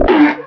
roller summon; hl2

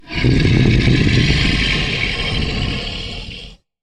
troll monster growl long; Gamemaster Audio; Troll Monster Vocalizations

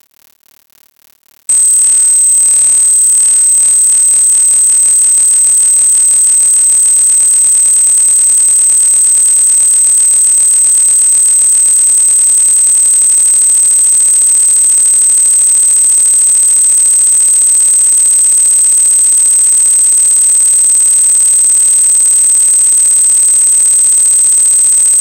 Electrical Interference High Frequency Crackle

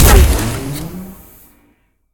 Assault Rifle Single Shot, Future Weapons; Resistance; SoundMorph

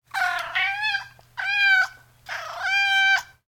Cat Moew; ANMLCat; Mechanical Wave; Sound Effects Collection

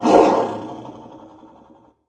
angry, antlion guard; hl2

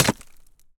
stone impact steel bar; BluezoneCorp

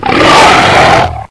bullsquid attack growl; hl2

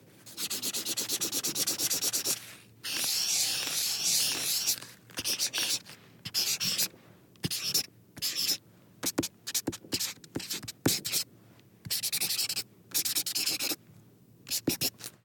Handwriting; TheWorkRoom Audio Post

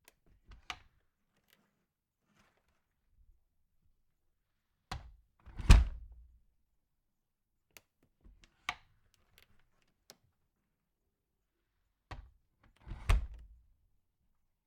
Door, plastic, close perspective, slow; Tone Manufacture; MicroTone Doors

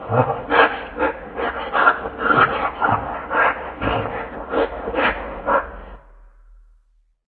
alert loop, antlion guard; hl2

